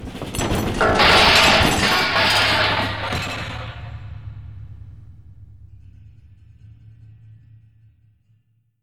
Wood Metal Glass Falling

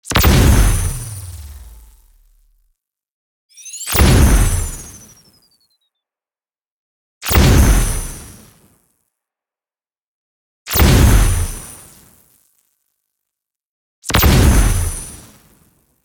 Plasma Rifle

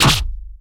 Energy Short Sword

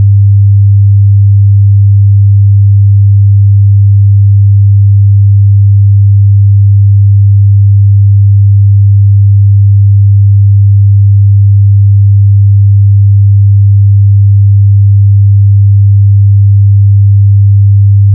Tone 100 Hz

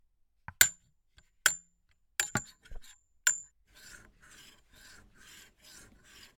Coat Hanger

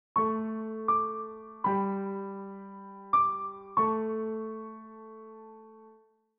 Sky Piano Jingle

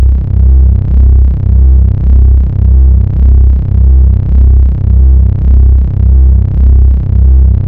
Dark bass

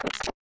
Glitched Sound

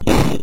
Kick Hard 8 Bit

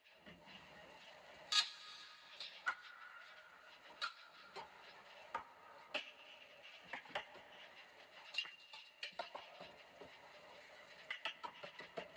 Creepy Atmosphere Percussive

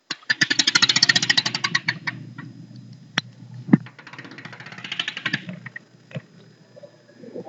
Clicking sound

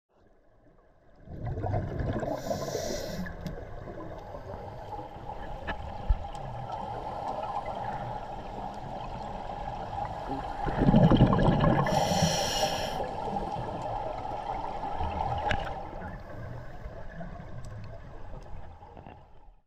Scuba Diving Breathing Apparatus Bubbles

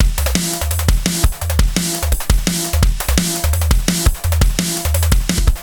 Subfilter

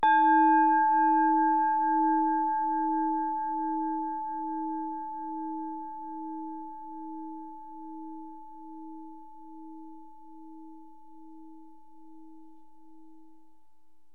Bell Outside

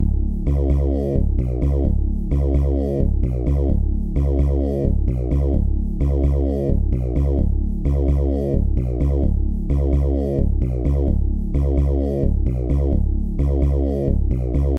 Bass

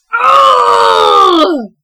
"Uuuuuugh"

